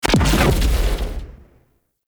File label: Rocket Explosion; Elite Jelly Rocket; Warframe; Railjack; Grineer; Space Fighter Dredger Agent